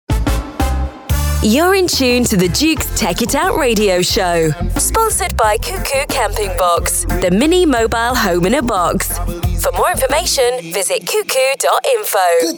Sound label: Radio Jingle; VDub Radio; The Duke